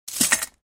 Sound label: Putting a jewel in the jewelry box